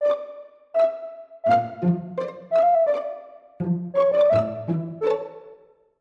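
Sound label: bgm C minor major 84bpm; 9beat; chicory a colorful tale; ps4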